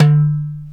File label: dum, pitch perc; East West - Global Sounds